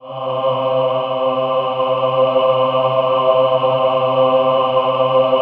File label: CHOIR